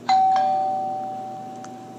doorbell ringtone; apple iphone 3g 4

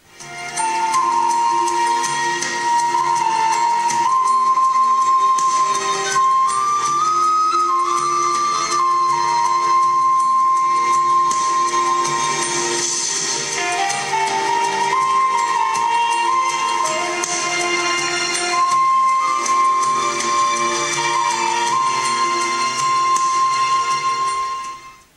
mother nature ringtone; samsung galaxy s3

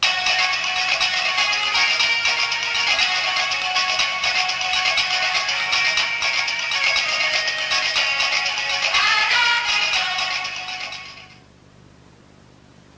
nokia 1200 hand drum ringtone

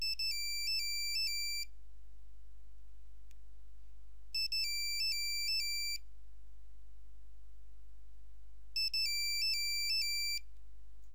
nokia 3310 city bird ringtone

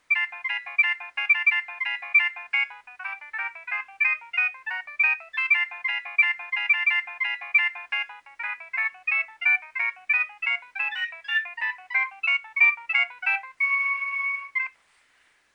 nokia3100 ringtone

